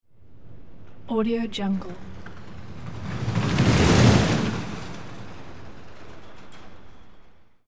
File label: rollercoaster passing quick and smoothly; hollywoodedge